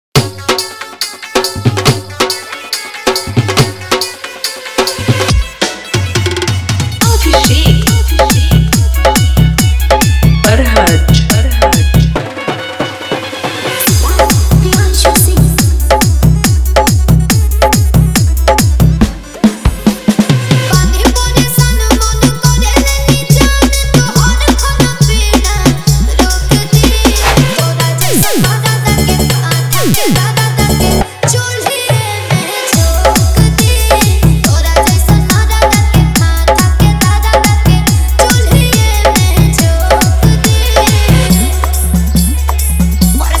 Shoft Vibrate Mix

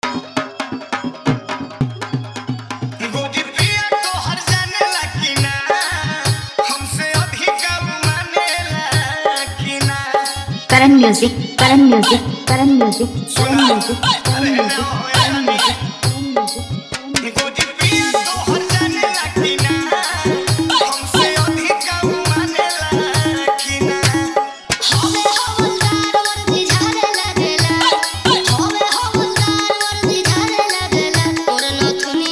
Jhan Jhan Bass Hard Bass Mix

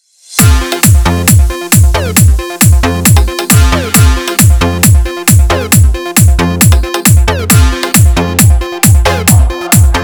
Hindi Remix Songs

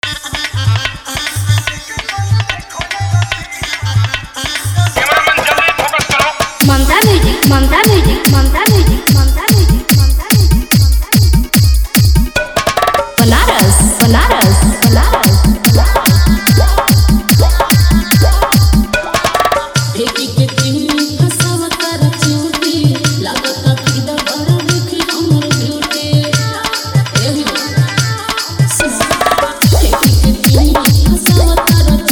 New Bhojpuri Dj Remix Song

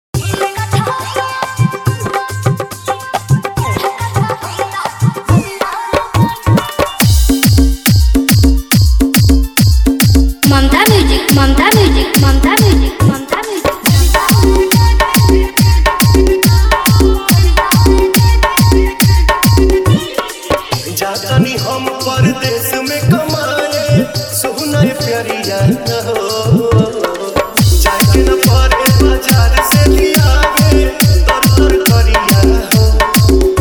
New Bhojpuri Dj Remix Song

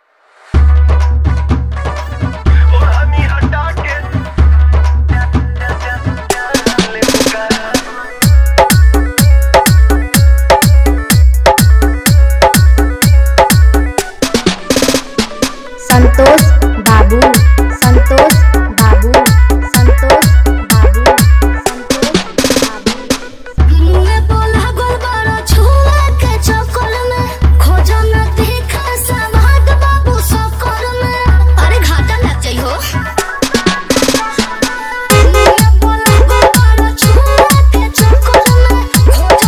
Vibration Bass Mix

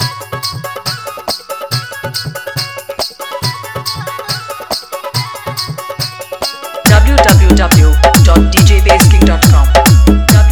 Hard Vibration Mix

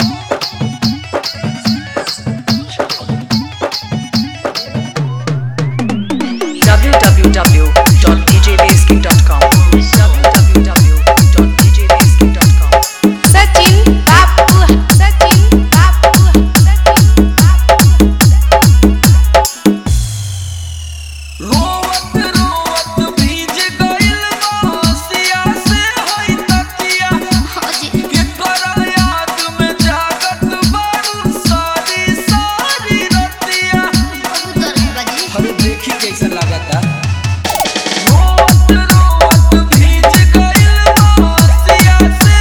Hard Vibration Mix

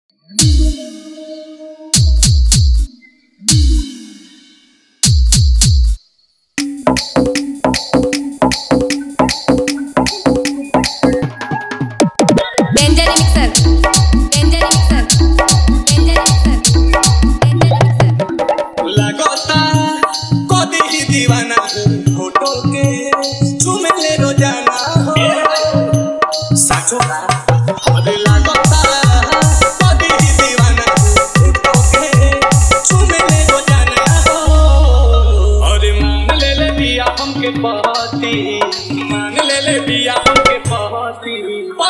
Bhojpuri Mix Full Jhan Jhan Bass

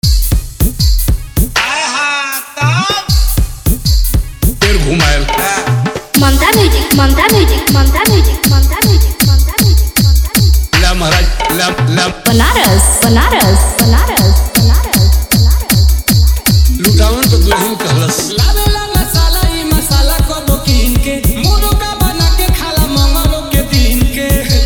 New Bhojpuri Dj Remix Song

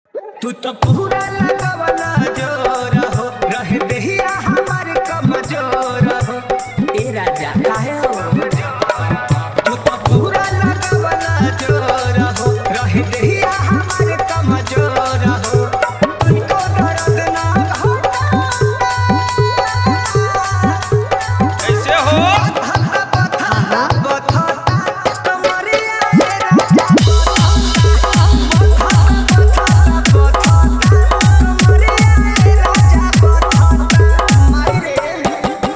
Bhojpuri Dj Songs

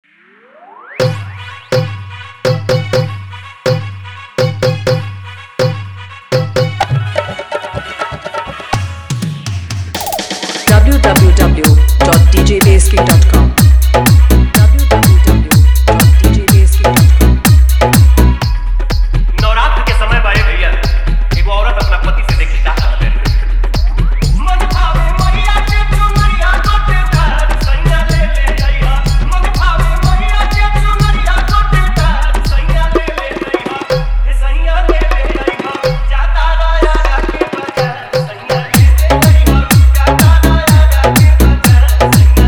Hard Vibration Electro Mix

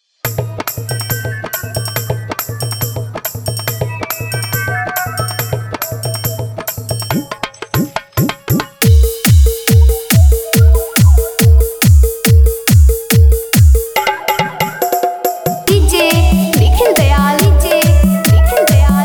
JBL Bass Mix

